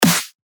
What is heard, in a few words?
Snare; Builder; revealed claps snares vol 2